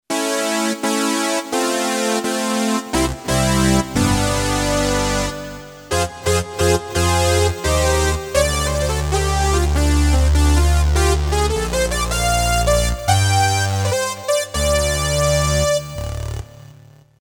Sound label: Fire Wire, Yamaha PSR-550 Voice